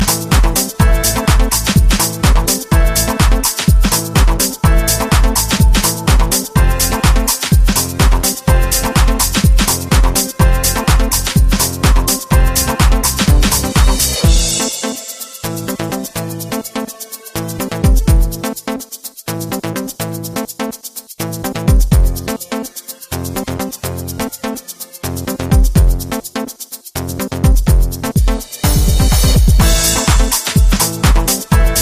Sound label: Soulful Dance Music No Vocal